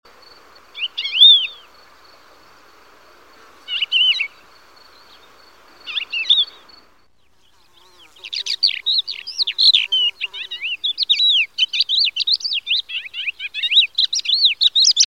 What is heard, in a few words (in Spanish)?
cogujada montesina